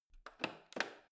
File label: plastic button creak defect press